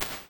Drum Verb; 0.3s; Small Spaces; Gated Reverbs; Apple; Another Drum Verb; Impulse Responses

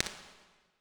1.1s Big Club Room, Impulse Responses; Medium Spaces; Indoor Spaces; Apple

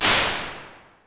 Live Chamber B, Impulse Responses; Medium Spaces; Apple

